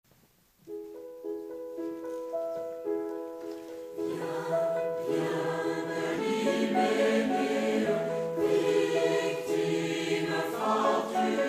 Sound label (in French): Berlioz choeur lointain; Ecully 2003